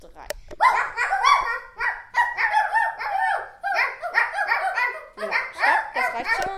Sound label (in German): bellen; hundeleben